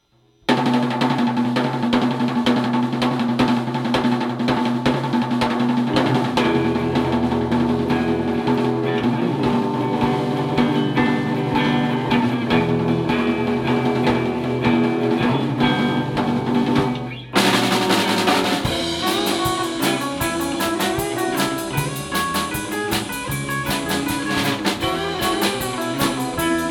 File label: Driving Guitars